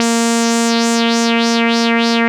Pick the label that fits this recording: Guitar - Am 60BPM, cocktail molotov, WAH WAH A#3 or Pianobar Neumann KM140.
WAH WAH A#3